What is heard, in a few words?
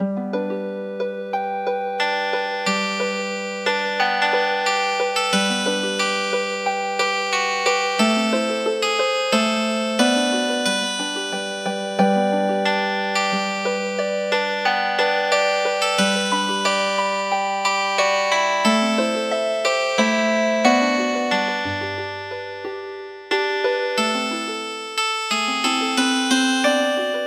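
Healing Harp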